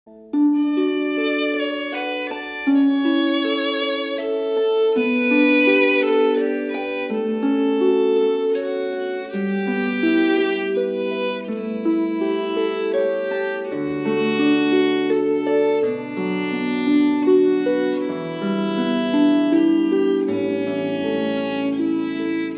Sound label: Waltz